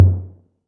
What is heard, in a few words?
Tom Low, drum machine; Playdate SDK